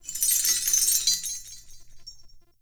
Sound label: Binaural Chains; Ambience; Warframe; Shadowgrapher